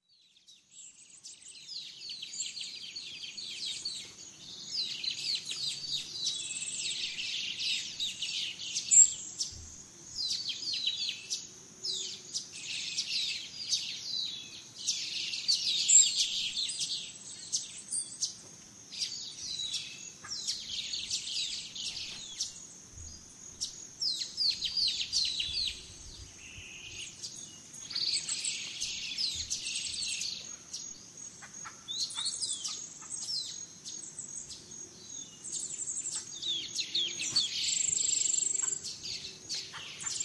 Dawn Venezuela Gran Sabana, Birds in the Rainforest; naturescapes